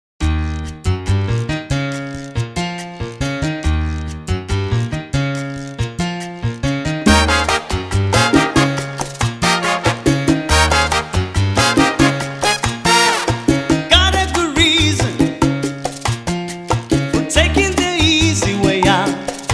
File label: Cha Cha Cha